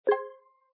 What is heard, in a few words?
UI spin; football; Brazil